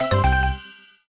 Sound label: Ding